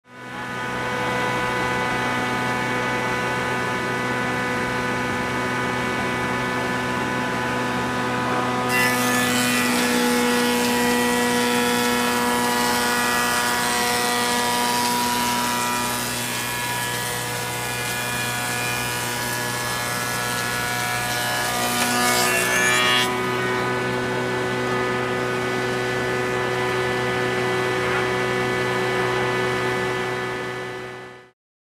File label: Medium Buzz Saw Cutting Wood